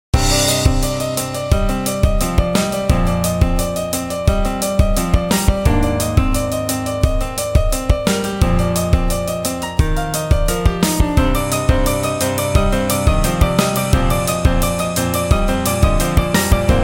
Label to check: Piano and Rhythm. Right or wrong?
Right